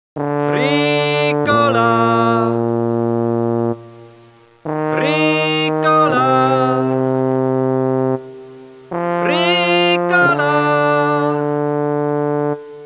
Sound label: Alphorn triple